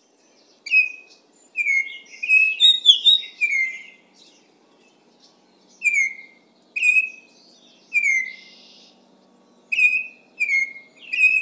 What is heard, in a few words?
Bird Song Baltimore Oriole